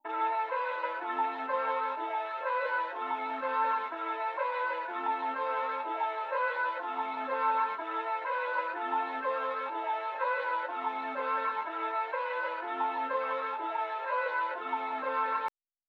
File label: 124 BPM A Min Synth; Cymatics; Destiny Gold Expansion; Dior